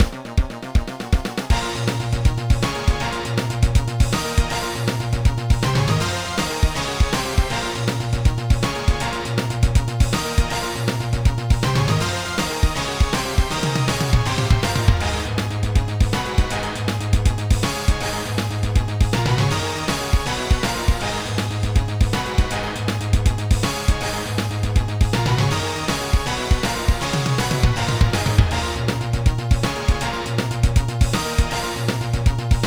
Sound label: battle; Grand Trucker Aniki Shigoto to Kenka to Koi Moyou; NDS